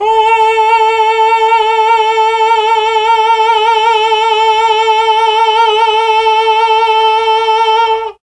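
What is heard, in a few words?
A3 chamber male, mellotron; CHMB MALE VC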